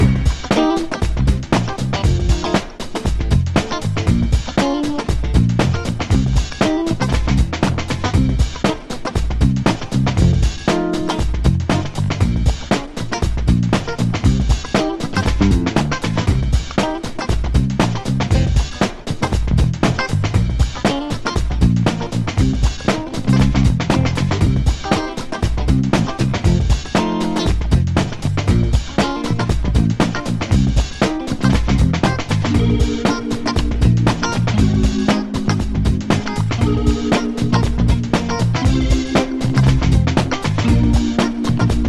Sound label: Shrimp Boat solo loop